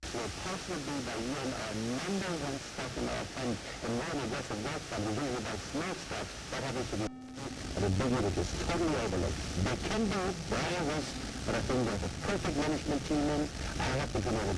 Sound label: AM Radio Static Broadcast